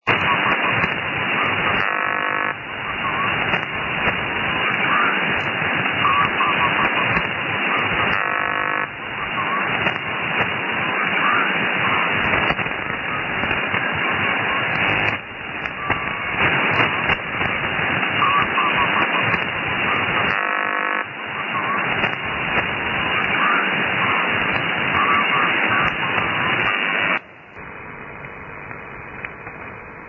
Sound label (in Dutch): ontladingen; 80 mtr; Static... Hier hoor je static, dit zijn de enorme bliksemstralen die je wel eens ziet; Haarlem